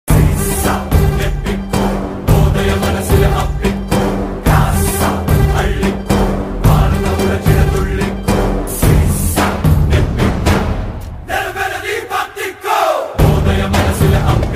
BGM ringtone, tamil ringtone; Babubali

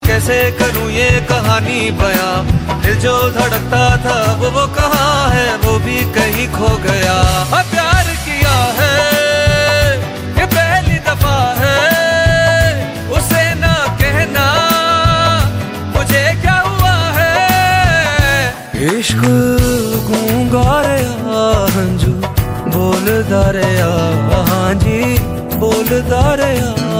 pakistani ringtone; Mushk

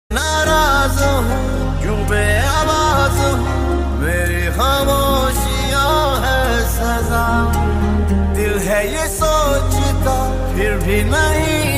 hindi ringtone

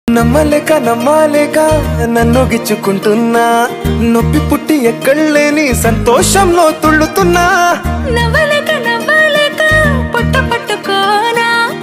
telugu ringtone

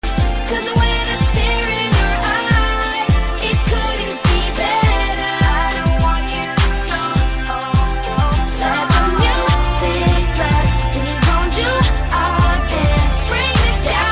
ringtone

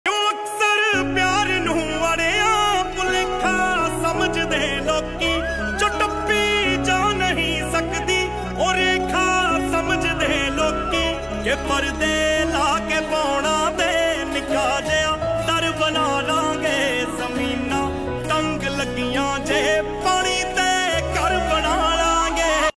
punjabi ringtone

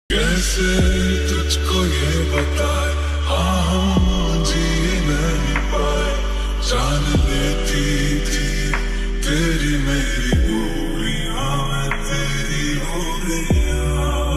lofi remix ringtone

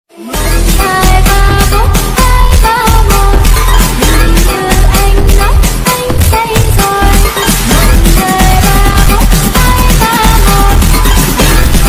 English Viral BGM ringtone

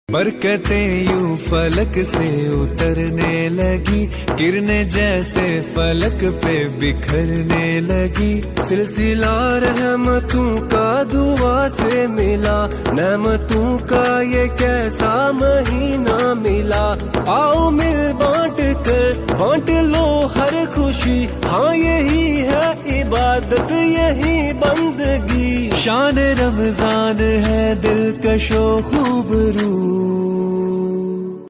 islamic ringtone

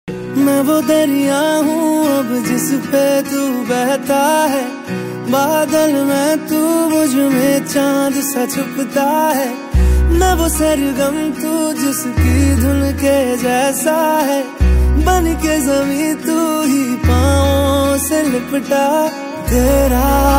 Ringtone

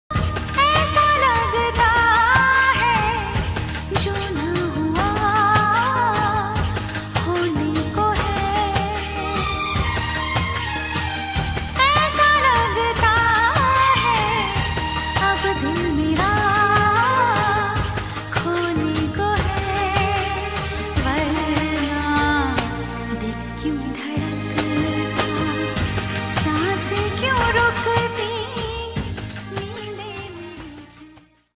female hindi ringtone

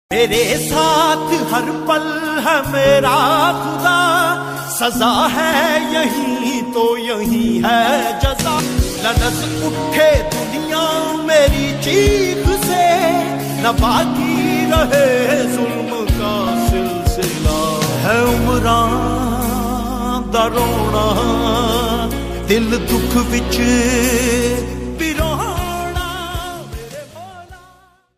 pakistani ringtone

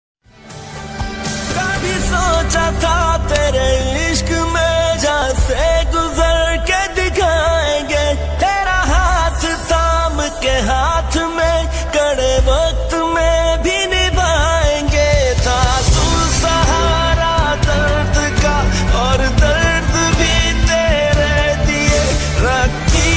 Pakistani ringtone; munafiq Ost

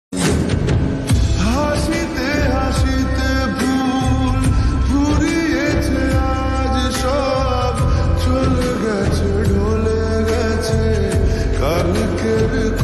lofi, bengali ringtone

